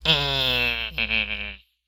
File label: Death, human male evil; customnpcs